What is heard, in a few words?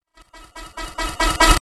delayed fade in title effect; hollywoodedge